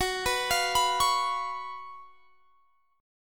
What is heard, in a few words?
Gb Major 7th Suspended 4th Gb, guitar